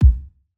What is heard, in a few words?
kick; Reference Beat BPM-130; Pophits